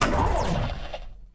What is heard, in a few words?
hurt, cylarkan she; combat; creatures; CosmicRage